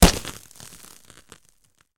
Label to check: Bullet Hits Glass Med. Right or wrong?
Right